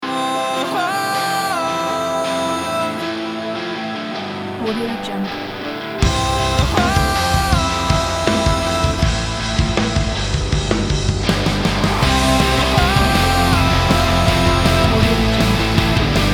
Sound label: motivational pop rock